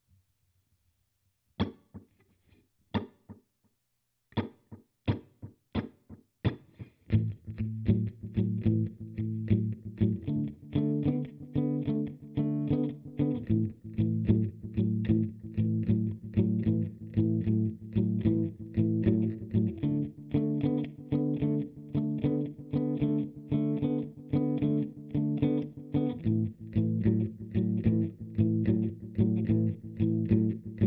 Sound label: Blues Pattern Track